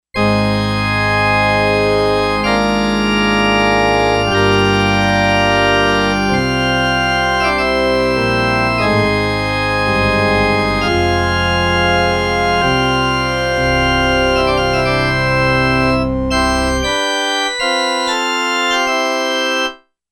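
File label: Pipe Organ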